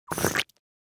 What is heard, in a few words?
Spaghetti sucking pasta eating